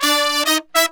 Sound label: 100 AM L, BRASS SALSA